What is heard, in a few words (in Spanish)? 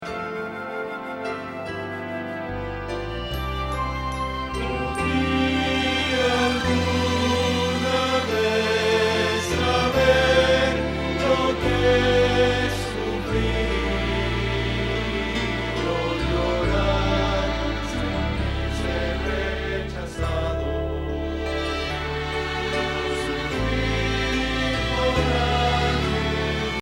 Bajo